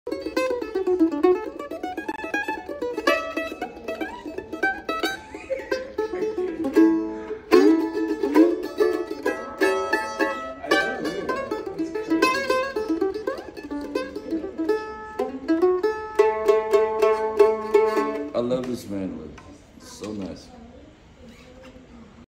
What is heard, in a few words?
Mandolin